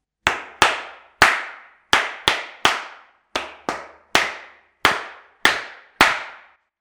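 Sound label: slipper sound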